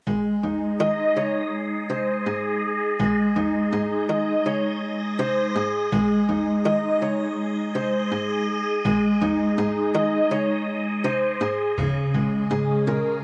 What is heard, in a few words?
hawaiian guitar